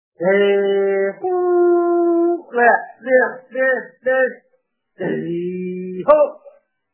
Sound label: How to call Gir Cow; increase cow milk naturally music